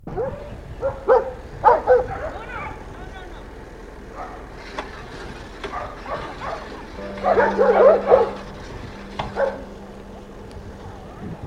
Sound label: doggies